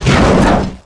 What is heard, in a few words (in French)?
impact coque